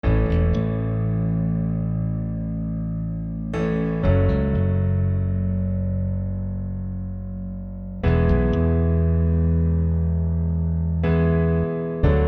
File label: I vi V IV Rock A